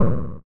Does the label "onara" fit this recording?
Yes